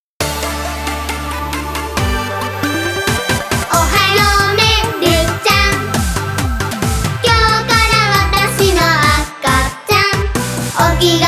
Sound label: dance full gassho